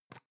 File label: plastic can lid screw open soft; objekte; dose